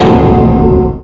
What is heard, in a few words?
shock